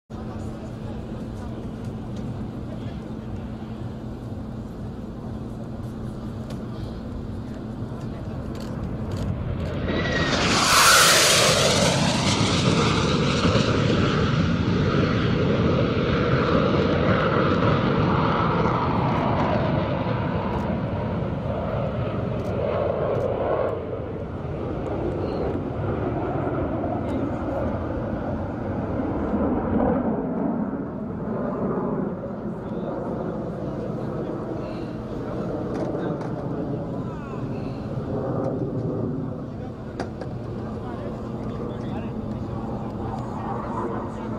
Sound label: HESA Saeqeh; جنگنده هسا صاعقه